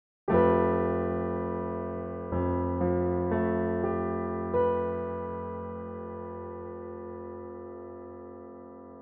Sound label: 13 edo chord